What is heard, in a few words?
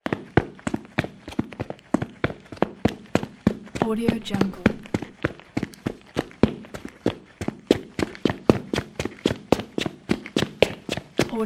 concrete running in boots